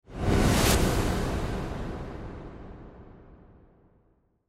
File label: Ghost Whoosh Fast Intense; Horror; Ghosts; Blastwave; Sound Effects:Horror